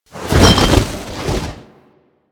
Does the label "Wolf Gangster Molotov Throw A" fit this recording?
Yes